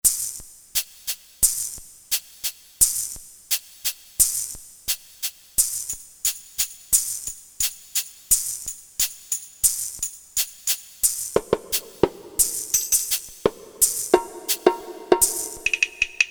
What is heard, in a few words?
LATIN PC, Percussion; Percussion II